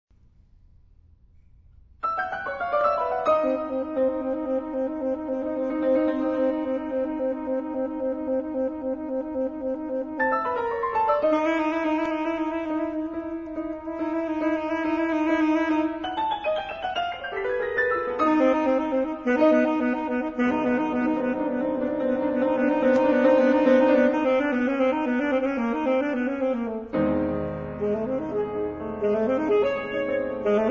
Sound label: saxsonata